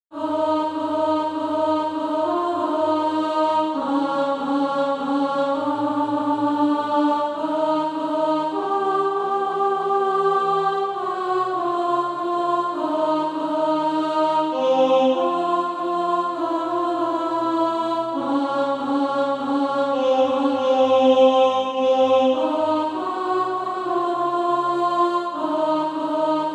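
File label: alto, SATB Practise Music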